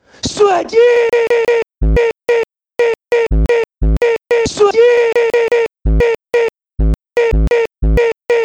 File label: brazil beat